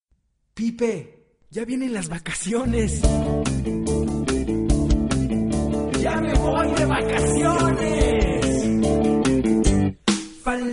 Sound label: pipe